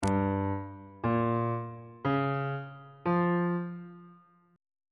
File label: g locrian 7th chord 1 is g half diminished 7th on bass clef